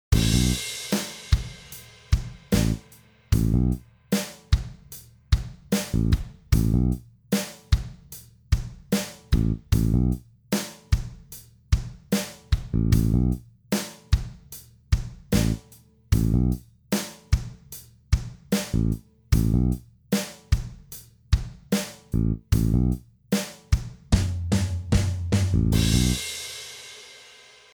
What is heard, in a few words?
beat moving exercise